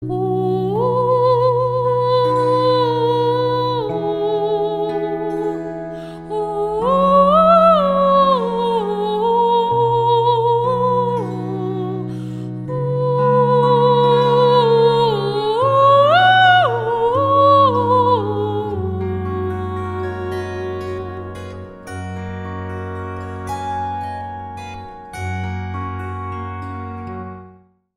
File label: Zither; Komm liebe Zither